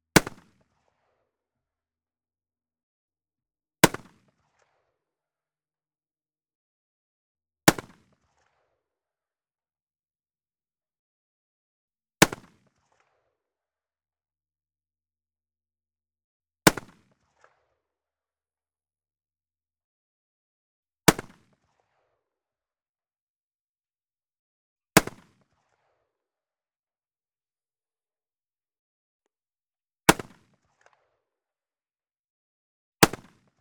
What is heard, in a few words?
K98k, Firing, bolt-action rifle; Mauser Karabiner 98 kurz; MKH416; Pole Position